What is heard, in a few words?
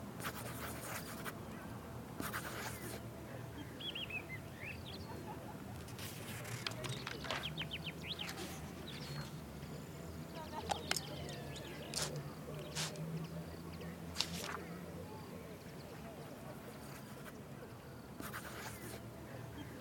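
Ambience Dojo Valentines Loop; Fruit Ninja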